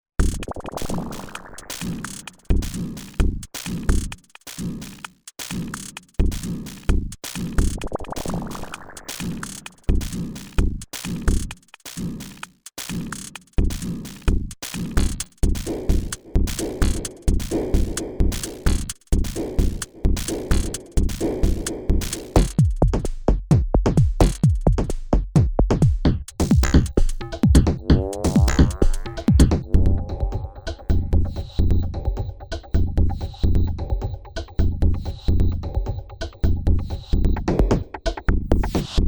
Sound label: Hybrid Groove; Spectralis